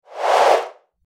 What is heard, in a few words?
Whoosh Swipe